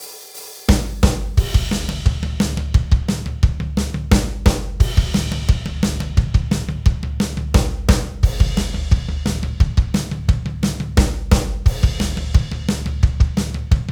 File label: drum